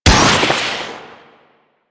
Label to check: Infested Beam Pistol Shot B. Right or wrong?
Right